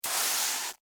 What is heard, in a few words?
Scrape Cereal Short, Texture, Scrape, Short, Cereal, Corn, Flakes; BlastWave